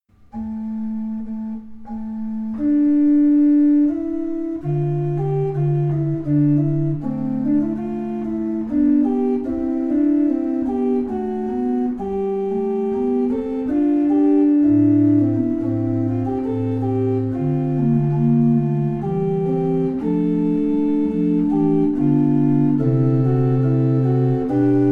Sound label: Kenneth Jones